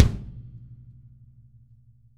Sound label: kick